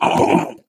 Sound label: ballbot hot laser